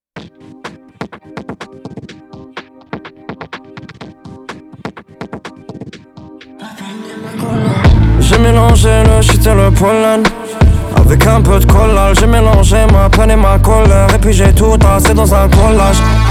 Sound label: cello